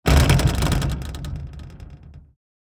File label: Metal blind frame shake rattle; blinds